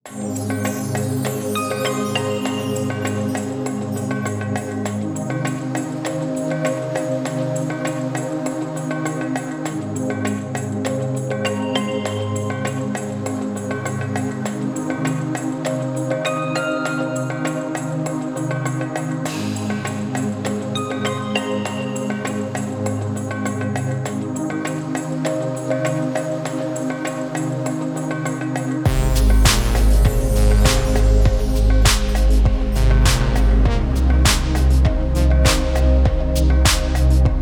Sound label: Balearic Chillout